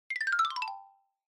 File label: Xylophone Slow Long 1 Down